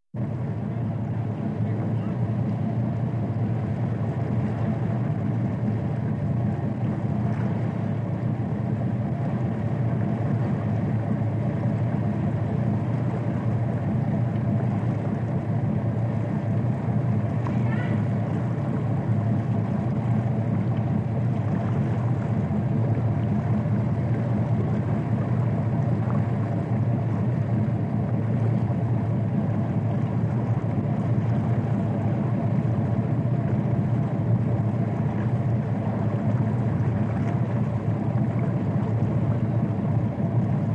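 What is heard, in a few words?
slowly moving barge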